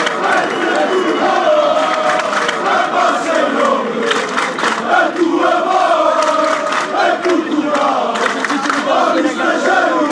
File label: benfica win for us fanchants